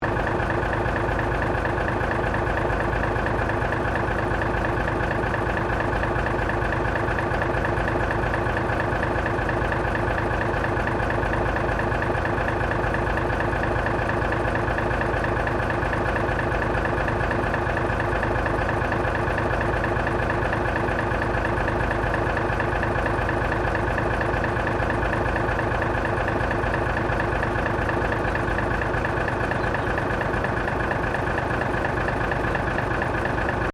Train Diesel Railcar DP50 1950 Tasmania Idling Loop; Bellarine Tourist railway vehicles